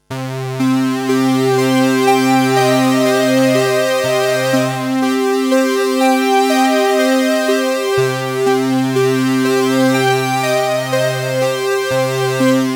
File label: Boss CE-300